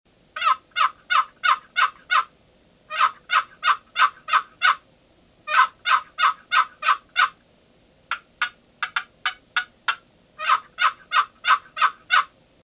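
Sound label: Mahogany deep trough, box call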